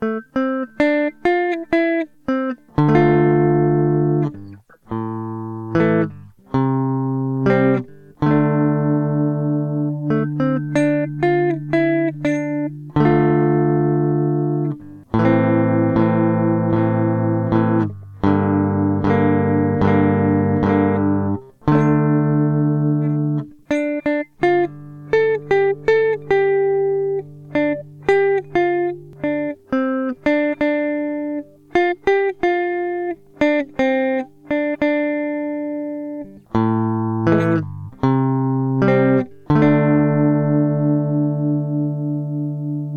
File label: jfet guitar buffer